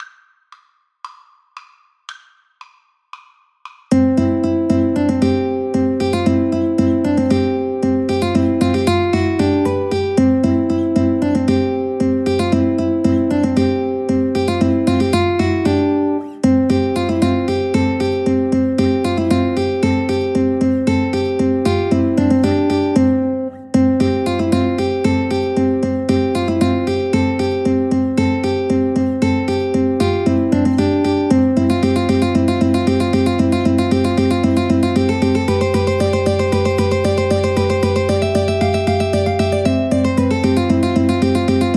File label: Ukulele Trio